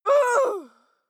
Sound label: female fight shout exert; schreie; menschen